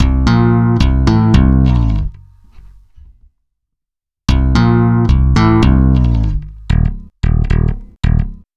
Stick Loop, Stick Bass; Sonic Foundry; Leo Cavallo Bass Taster

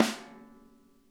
SNARE; Sonic Reality; Interactive Drum Kits

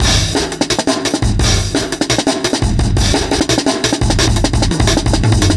Brown Filter 4 Bar, Drum n Bass, Beats; KJ Sawka; Drum n Bass & Beyond

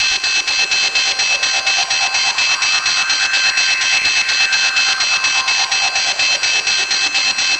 MACHINES; Masterbits; Tekkno Trax; MACHINES+FX

